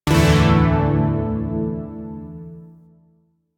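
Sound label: Shawzin Pentatonic Major Chord E; Zariman Shawzin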